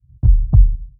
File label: heartbeat